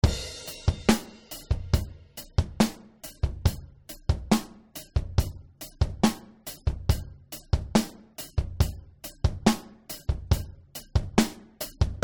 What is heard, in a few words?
Elysia Karacter Drums Extreme